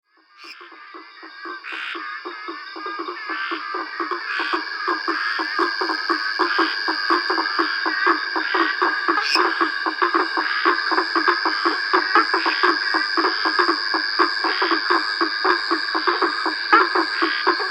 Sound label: Mata Atlántica Nocturnal Concert; At San Pedro